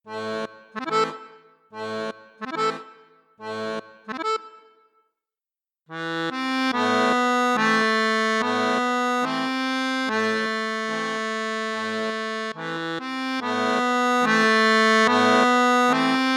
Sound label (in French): accordeon, registre 16; la forza del destino ouverture; borsini concerto medio